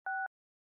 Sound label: Owl Playback; NB and PEI